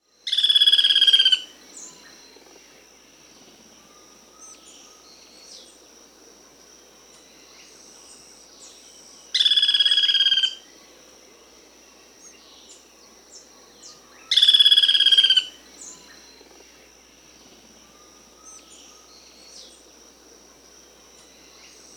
Chestnut-crowned Foliage-gleaner (Automolus rufipileatus), Peru; S. America